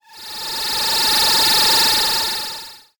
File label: ufo beam me up; sound design; zapsplat